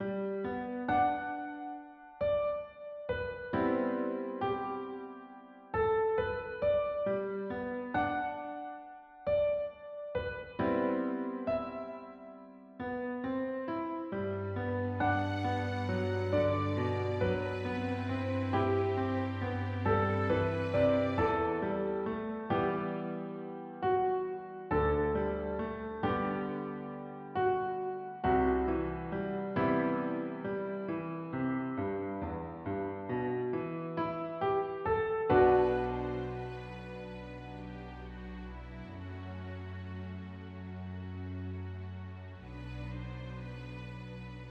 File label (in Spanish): Instrumental; Musica Original